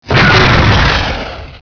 flyball hit; gearbox; flier

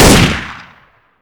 galil, weapons; svencoop

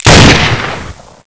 44 fire, weapons; firearms

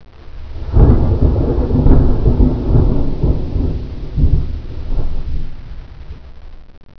thunder; cstrike; mansion

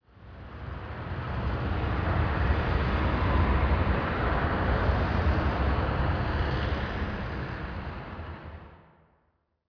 car; cstrike

